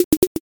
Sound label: horse hoof